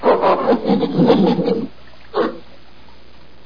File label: ape sounds